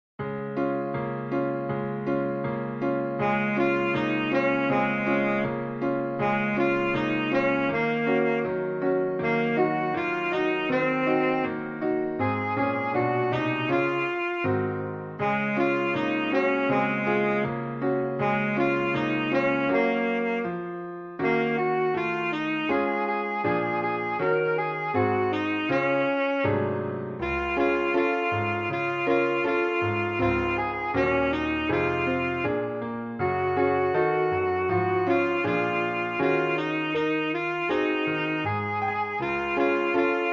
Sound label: jingle bells; pierpont james